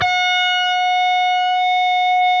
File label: PRS G, Guitars, LEAD